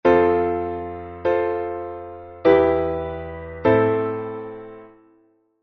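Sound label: 436 chord progression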